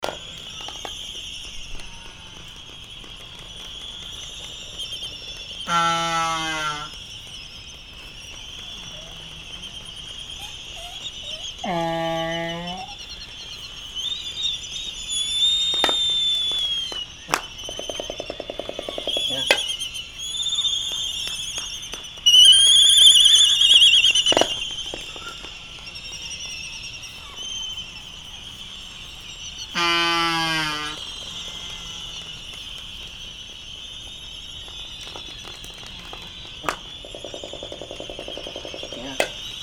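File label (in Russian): temnospinnyy albatros voice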